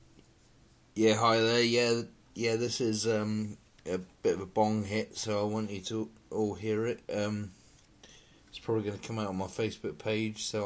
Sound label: bong hit